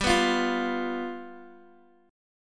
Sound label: G 7th G down Guitar DADGAD